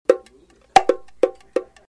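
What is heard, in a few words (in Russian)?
бонго мелодия необычная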